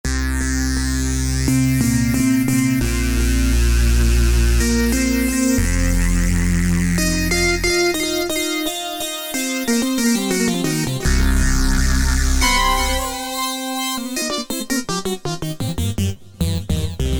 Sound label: Tek, Alesis Rap Techno Dance; AwesomeTek